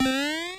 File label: jump; project hawk